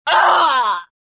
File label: choke; Star Wars Jedi Knight II Jedi Outcast; XBOX; bespincop